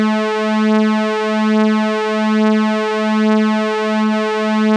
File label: TUNE, MOOG Bass; Masterbits CLIMAX 7; MORE MOOG